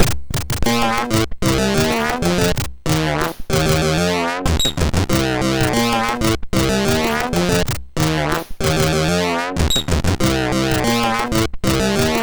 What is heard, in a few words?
MOS 6581R3